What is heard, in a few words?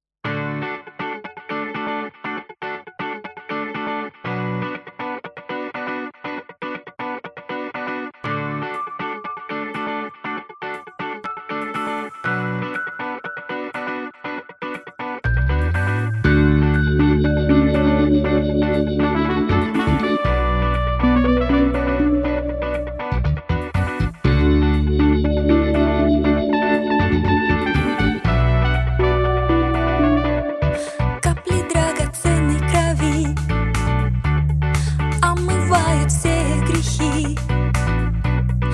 Drops